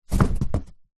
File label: sport exercise mat foam thin rolled up set down